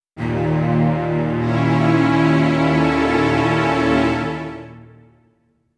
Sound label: strings